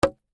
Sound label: Wood Hit Basic Wood Impact, Wood impact with wood; Wooden plank hit other wooden plank